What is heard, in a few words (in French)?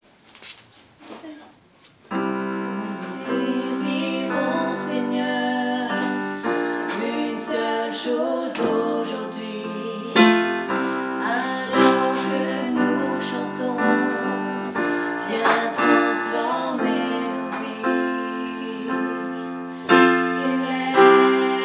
voix eleo eleve eoi en do